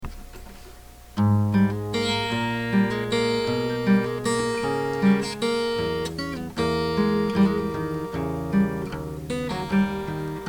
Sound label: guitar